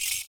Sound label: FOOT PERC; Univers Sons - Raricussions